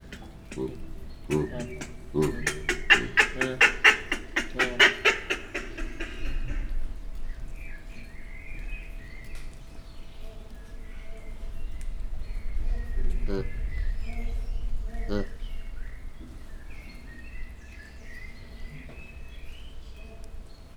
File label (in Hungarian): elmélkedésre alkalmas környezet, galléros pávián; pecsi zoo 2017